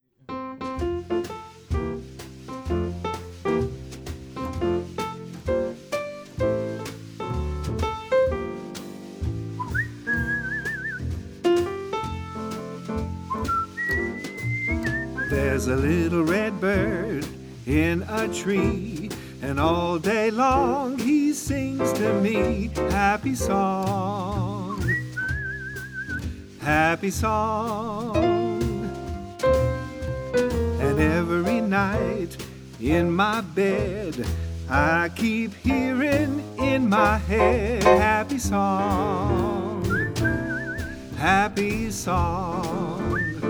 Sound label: Happy Song Whistle